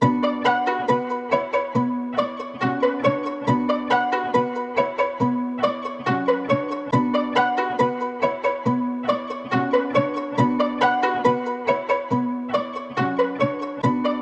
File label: 139 BPM B Min Fake Ukelele, melody loop stem; Trap; Cymatics; 2022 Melody Collection - Trap Pt 2; Jet